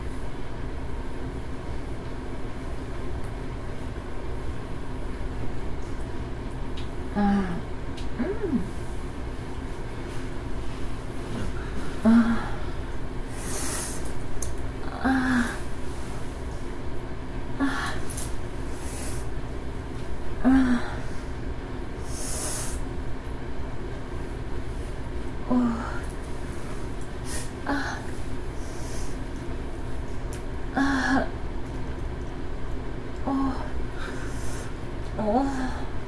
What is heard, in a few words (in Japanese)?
スペイン女性とホテル